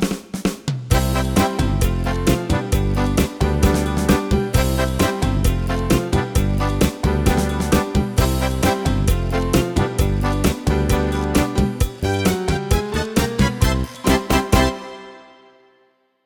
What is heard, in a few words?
PSR2000 ChaChaCha; Dennis Schulmeister